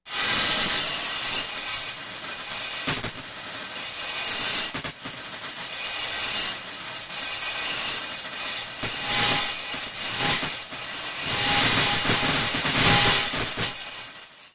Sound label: Glass